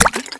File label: die; CLIOLIMA